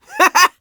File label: chuckle; sonic